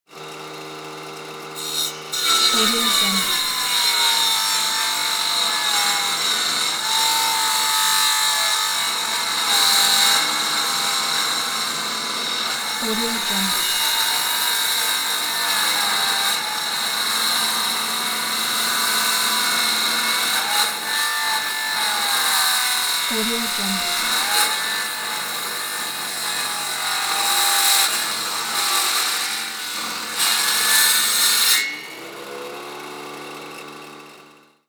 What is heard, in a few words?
circular saw